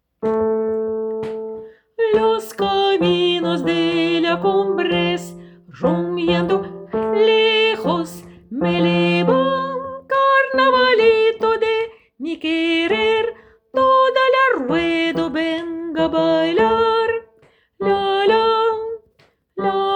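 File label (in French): Carnavalito hommes la fin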